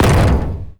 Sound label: sentry buster step; Garry's Mod; tf2; mvm